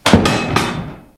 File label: Object falling; gcompris; memory sound